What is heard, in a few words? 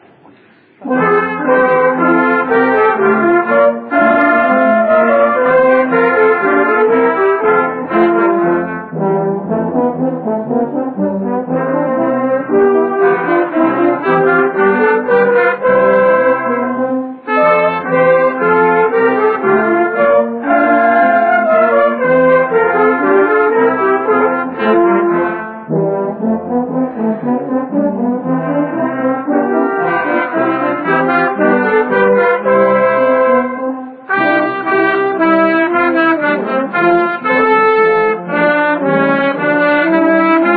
Bourree; sommerkonzert